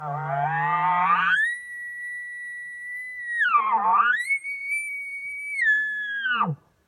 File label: Calls Double Bugle